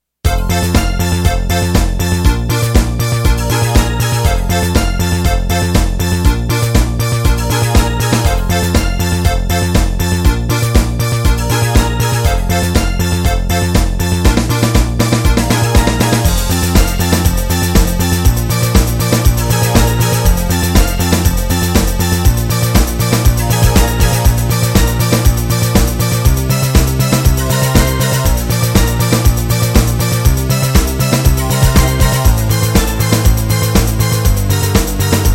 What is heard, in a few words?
dance